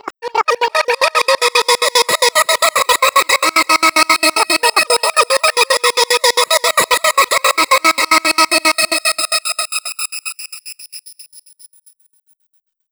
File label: MONGOL V FX, Asian Traditions; USB Soundscan vol.60; AKAI